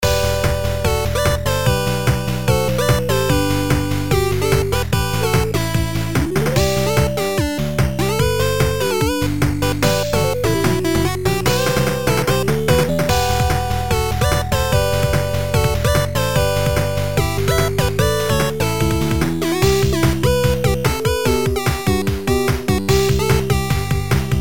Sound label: 8bit; No Game No Life